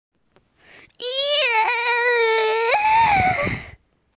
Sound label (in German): stohnender mannerchor